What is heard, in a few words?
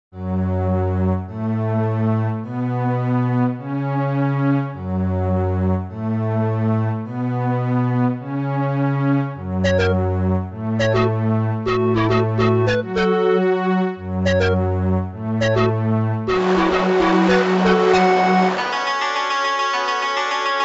Violin